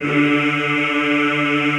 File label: D2, Classical Choir; Best Service; Extended Classical Choir